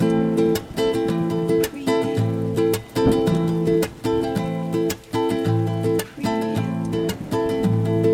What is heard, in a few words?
Guitar Bastaki 110BPM Am, nylon guitar, 6-8 persian bastaki; beatcraftpro